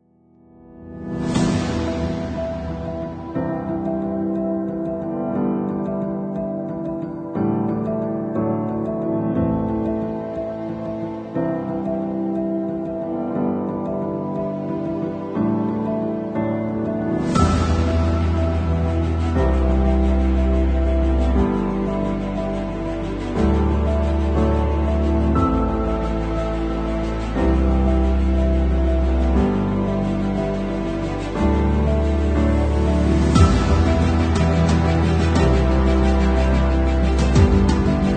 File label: Epic with Orchestra Choir, 严肃，意志坚强，战斗，英雄，爱国，自命不凡，有前途，情感，鼓舞人心，史诗轨道与钢琴，管弦乐队和合唱团。 低钢琴和弦设置一个严重的情绪介绍，和强大的史诗鼓和明亮的铜管乐器音符创造一个悲怆的; 音乐素材 管弦乐队和合唱团的史诗 Epic with Orchestra & Choir